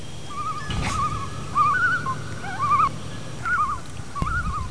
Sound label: loon